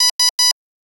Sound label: Beep, Buzz, Clean, Tight, Mid, x3; Beeps and Buzzers